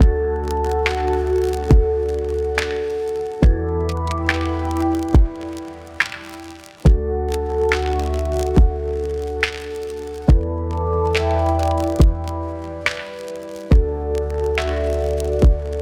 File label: lo-fi ambient music loop, 70 bpm